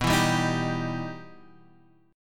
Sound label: E Minor Major 7th